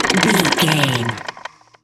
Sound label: gun auto barrel spin slow stop long